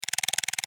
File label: hook loop